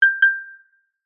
multimedia alert prompt feedback tone simple single note double sine chime